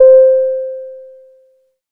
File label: table flip notification; [Joule sous-vide cooker ding]; Heavy taunts